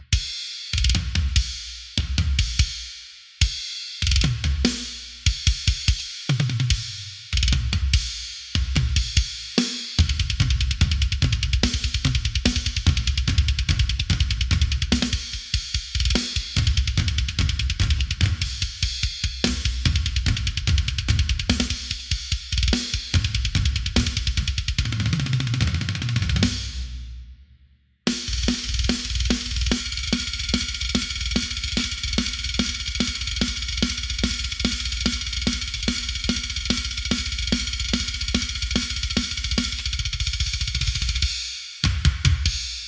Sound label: Drums